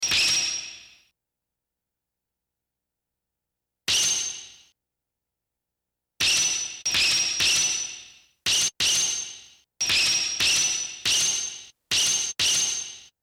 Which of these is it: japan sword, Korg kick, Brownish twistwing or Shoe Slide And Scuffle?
japan sword